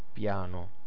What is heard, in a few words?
piano; kerros